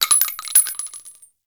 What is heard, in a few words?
Pistol Shell Droppings; Warframe; Grineer; Orokin